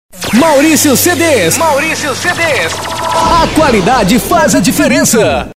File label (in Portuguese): abertura